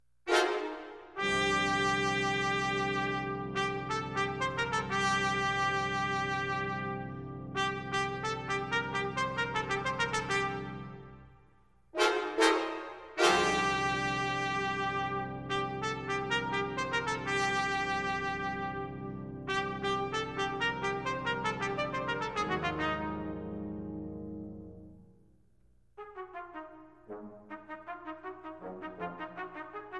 Concerto for Trumpet, Brass Ensemble, and Percussion Allegro